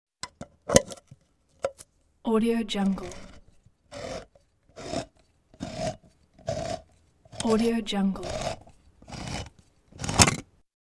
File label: open a tin can